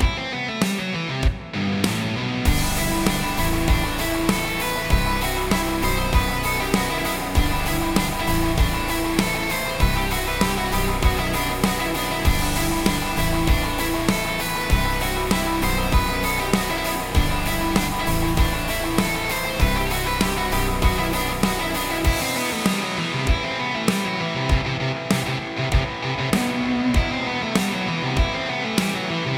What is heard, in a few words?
Rock; life of slime 2023